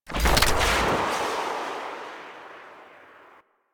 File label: Skiff Engine Boost Slow Stop, Vehicles; Warframe; Grineer Skiff Player Vehicle